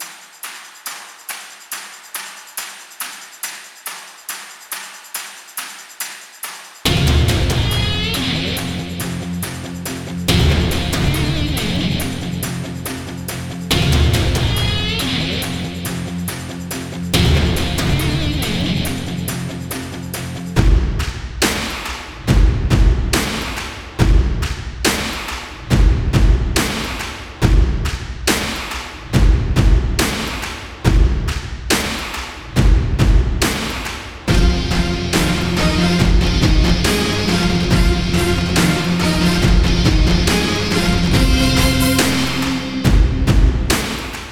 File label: BGM Mix; Youth Yuvaraj